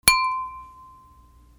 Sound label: BANGS